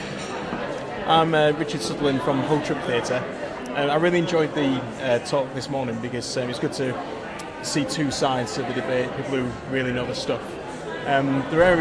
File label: hull truck boo